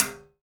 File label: railing, metals; Spectrasonics; Liquid Grooves 1 & 2